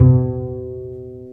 Cb Pizzicato, String; Cb Pizz 1; Roland - String Master Series

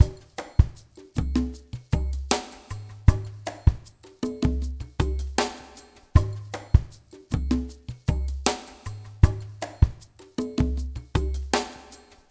ICE BRUSH FULLMX, Liquid Grooves; Spectrasonics; Liquid Grooves 1 & 2

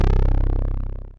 505, String Machines

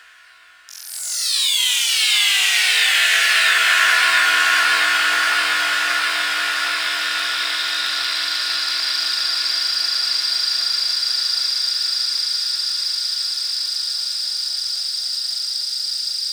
Atmos; neuro; Optiv & BTK

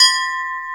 Bell; AKAI S-Series CD-ROM Sound Library VOL-2

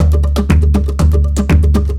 120 PERC; Best Service Advanced Media Trax Modern Composer

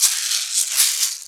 PERC, PERCS KIT; Ragga & Dub Reggae; USB Soundscan vol.27; AKAI

